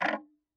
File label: Tick, Clocks Kitchen Clock